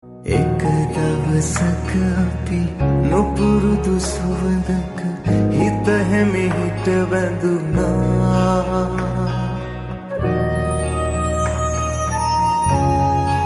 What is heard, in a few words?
sinhala song